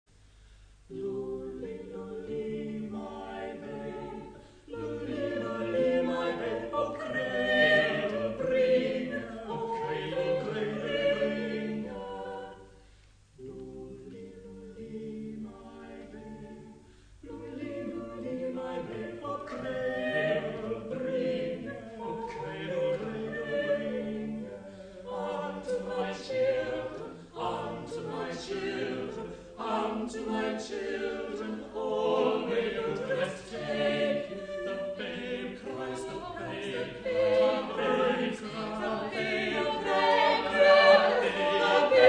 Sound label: baby